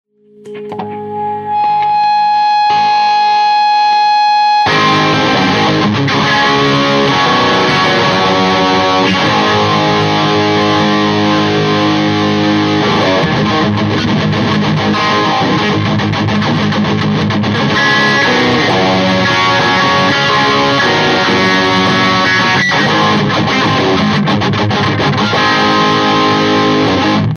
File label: Loud bog